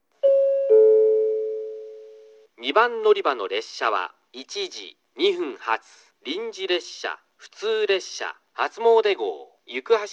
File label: hatsumoude yukuhashi, portable recording